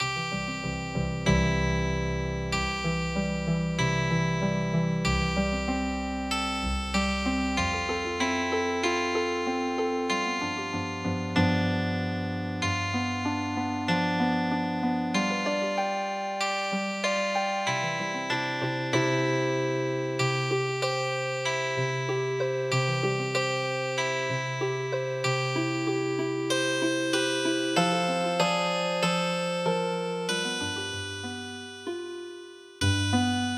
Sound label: Healing Harp, traditional